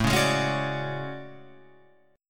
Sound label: A Major 13th, down Guitar Open F; AM13; strummed; JGuitar